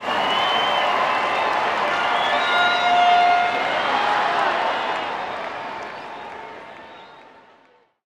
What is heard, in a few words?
Baseball Crowd Reaction; Crowds